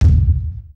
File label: Claymore Mine Detonation; Weapons & Firearms; Sony Pictures Sound Effects Series Volumes 1-10; Sony Creative Software